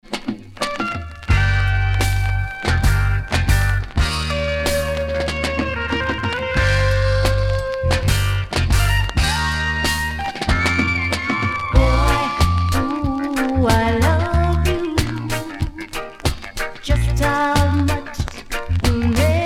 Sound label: Trance